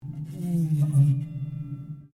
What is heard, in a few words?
Piano Upright Broken String Slide Down Rubber; Upright piano without keyboard; Pianos; Musical